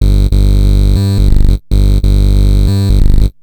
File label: Analoop, Industrial Analog